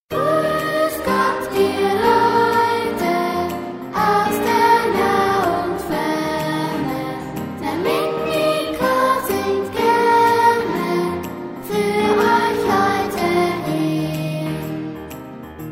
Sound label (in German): Melodiengruß